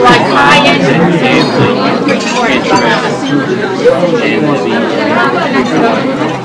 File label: party; Sound effects